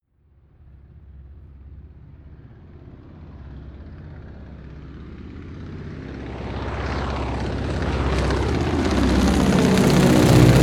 propeller plane ju52 fly by overhead short to left